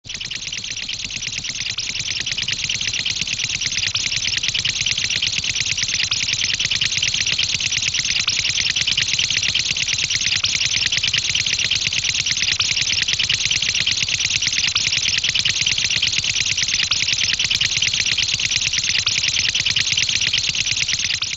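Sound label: engine running, cartoon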